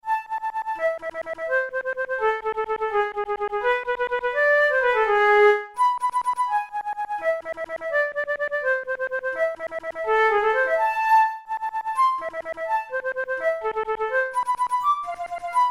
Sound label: studies for the boehm flute; drouet 72